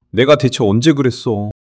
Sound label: surprise